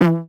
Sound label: Mouth Bass; Aawaz; Gully Loops